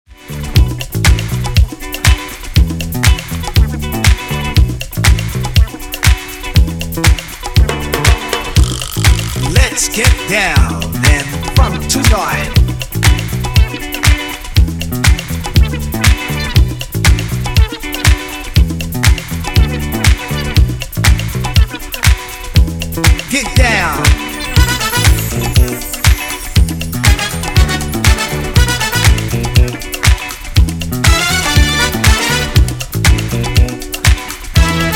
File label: Funk Mix